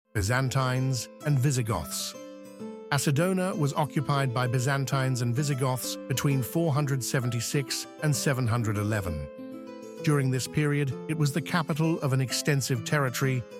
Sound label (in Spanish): música; archaeological museum audio guide